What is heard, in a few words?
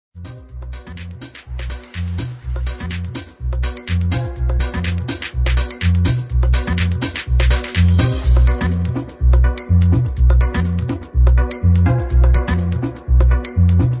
TECH HOUSE PERCUSSIVE SAMPLE PACK